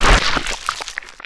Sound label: death; alienarena; brainlet